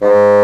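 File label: Bassoon mf G#2; Digital Sound Factory Virtuoso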